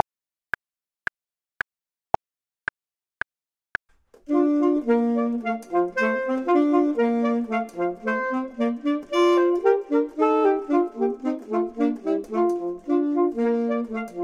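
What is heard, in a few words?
both, Saxophone and Clarinet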